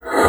LUFT, bassoon; Xsample VOL-6

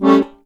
HOHNER, AKKORDEON

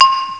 Glas; ST-XX Amiga Soundtracker Sample Packs

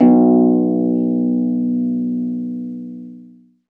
HARFE H-2; Best Service

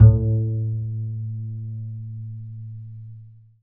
KONTRABASS; Alpine Volksmusik

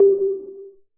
echolot; Masterbits; Vintage Voltage; SEQ-FX

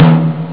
pauke; robocop; ST-XX Amiga Soundtracker Sample Packs

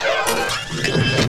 ROBOTE; Best Service; Advanced Mega Effects

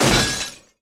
GLAS FX; Best Service; Advanced Mega Effects

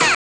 stoehn; Amiga Soundtracker Sample Packs

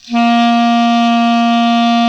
KLARINET C 2, CLARINET

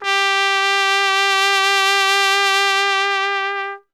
TRP WEICH G1, TROMPETEN, Alpine Volksmusik; Best Service

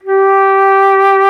FLOTE, Alpine Volksmusik; Best Service

